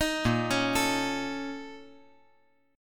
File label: Bb Minor Major 11th Bb; BbmM11; arpeggiated; 8,10,9,12; JGuitar